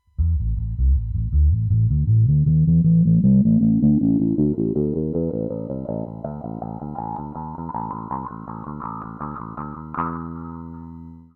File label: wahoo res